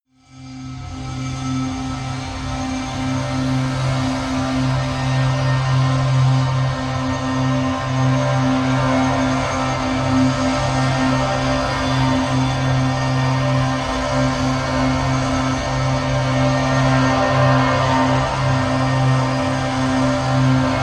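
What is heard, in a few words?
drone light abrasion c; brand x music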